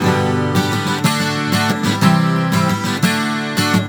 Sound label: GTR, Celtic Flavours; USB Soundscan vol.42; AKAI